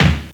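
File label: Fairlight IIx CMI Kick 3; 300 Drum Machines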